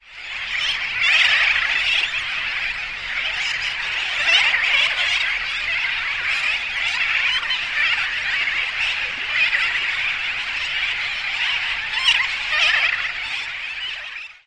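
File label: deafening colony, Sooty Tern; Dry Tortugas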